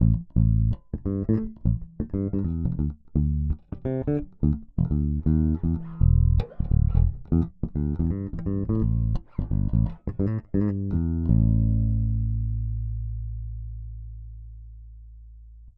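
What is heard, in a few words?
Ibanez EHB1006MS Finger Both Passive, bass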